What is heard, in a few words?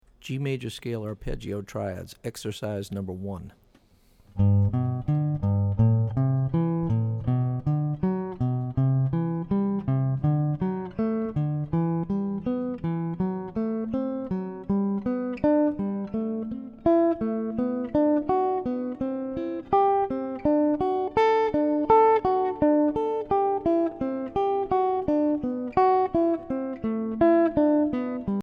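G Major Scale Arp Triads; lead skills materials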